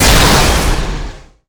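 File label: Explosion Small Energy; Destruction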